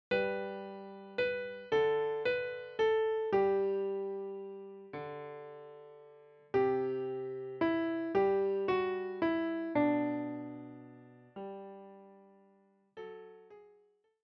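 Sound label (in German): Leise rieselt der Schnee Klavier; deutsches Weihnachtslied für Klavier solo, leicht; Leise rieselt der Schnee - für Klavier, leicht mit Aufnahme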